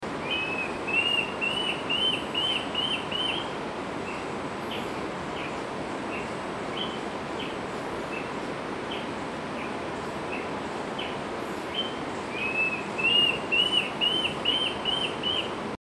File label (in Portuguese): Borralhara (Mackenziaena severa)